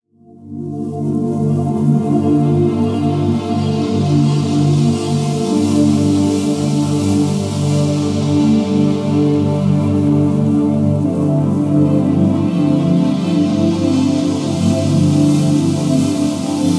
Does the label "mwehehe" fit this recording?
No